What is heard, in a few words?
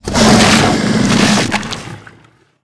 worm striking arch